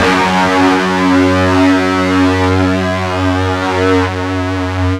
FUZZ F#1, Guitar; East West; Steve Stevens Guitar Samples Collection

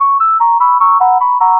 Flutey High Cycle, Synthetic Pulses

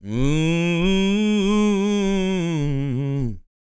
MOANIN, Vocal Planet Blues Soul; Spectrasonics

